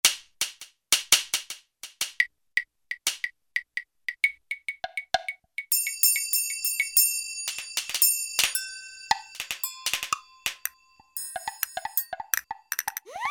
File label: Orchestral Toys; Roland L-CDX-04 Strings And Orchestral Percussion